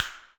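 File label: Fire Cracker, Alesis SR16; FireCrkr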